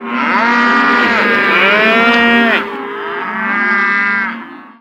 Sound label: Cows; Orphaned